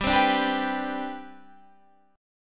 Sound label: C Major 7th Suspended 2nd, G, chord